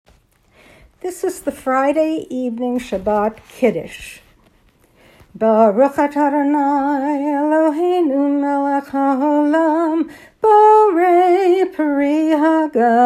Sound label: Melody Kiddush Friday night